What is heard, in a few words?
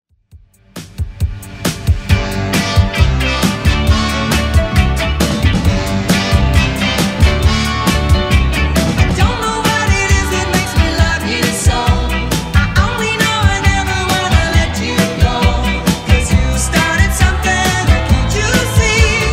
60s, 135 bpm; Redrum